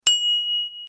Fahrradklingel Paprika

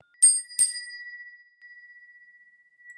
Fahrradklingel Classic Blumen

